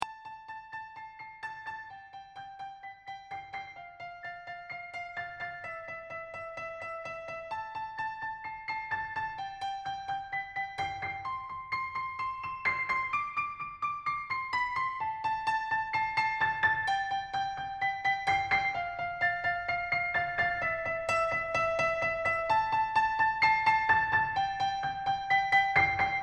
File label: PIANO CONCERTO; MELODIA